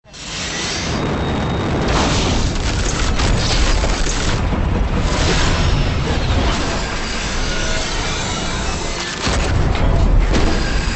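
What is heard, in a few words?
plane falls onto the runway and slides on its fuselage to a stop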